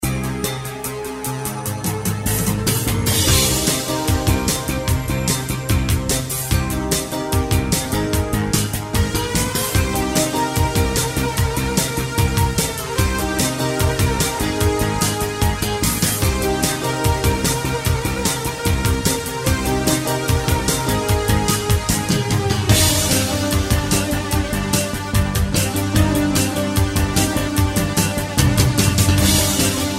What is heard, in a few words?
Mystical Mood Ringtone